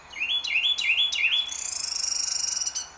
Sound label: carolina wren